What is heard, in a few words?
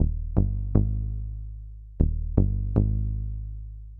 SH101 Bass Loop (120BPM), retro house